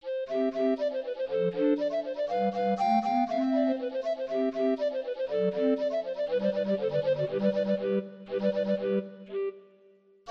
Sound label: musical clock, recorder; beethoven